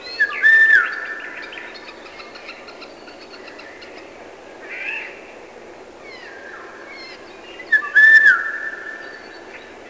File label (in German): pirol; voegel